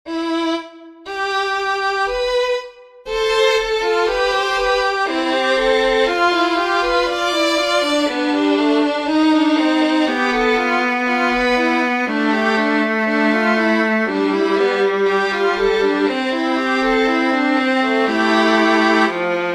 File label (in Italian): quartet corda